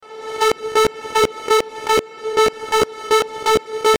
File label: Dirt loop without compression